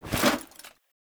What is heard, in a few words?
Foley Action Armour Melee Heavy Swipe; Characters; Player; Deora Armour